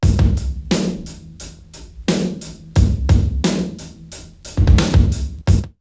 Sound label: home boy 88, drum loops, old school; X-Static Goldmine